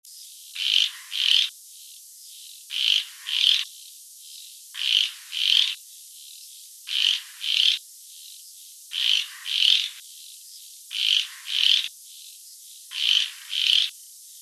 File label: R bonfilsi